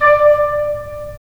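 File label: wind clarinet; LA Composer vol. 3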